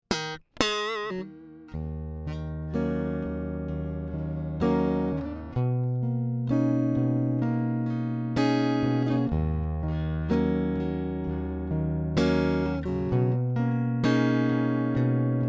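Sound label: Vibro King Tremolo; fender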